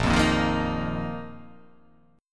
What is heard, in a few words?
A sharp Suspended 4th; strummed; A#sus4; JGuitar